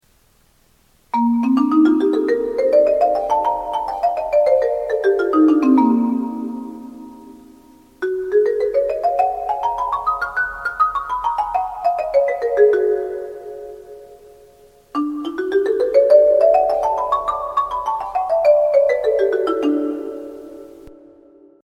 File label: scale set